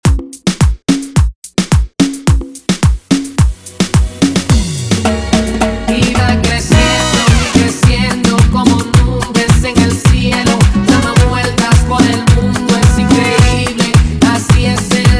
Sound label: 108bpm, Intro Outro